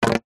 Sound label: blow air into tube thin water gurgle dribble short burst; zapsplat